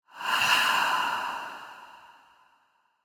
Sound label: scary breath whoosh; fvtt sonniss gdc audio